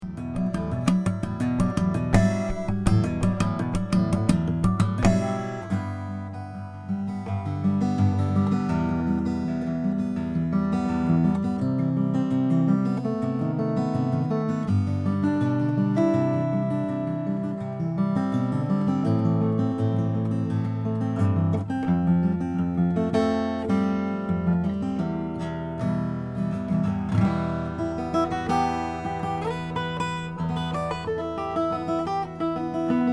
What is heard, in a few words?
rainsong rs1; pear